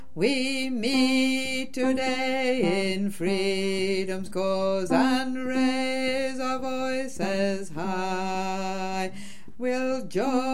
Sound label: Hold The Fort Ten